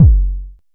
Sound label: analog deep, Kickbank; Ableton Foundation FreePacks